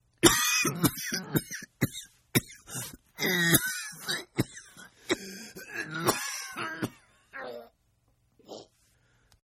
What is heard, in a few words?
Cough Sick Male; Sickness Sounds; Human